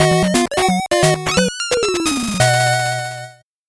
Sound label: 8bit jingle normal